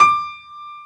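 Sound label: grand piano; B-GRAND PF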